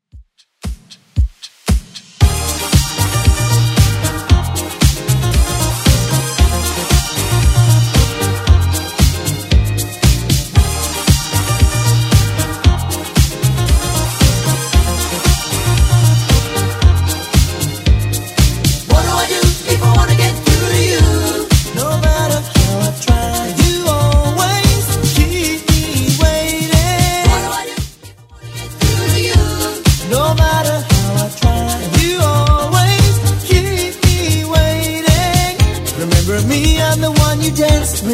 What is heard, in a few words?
80s 115bpm; Redrum